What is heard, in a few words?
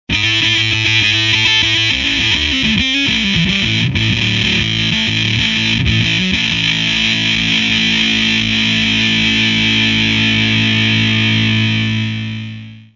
fuzz; bridge humbucker, tone full CW